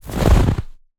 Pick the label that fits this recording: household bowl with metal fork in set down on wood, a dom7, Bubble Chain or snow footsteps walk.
snow footsteps walk